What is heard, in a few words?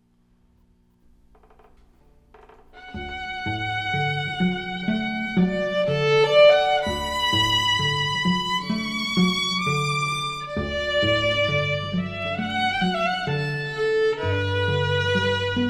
Meditation Thais Duo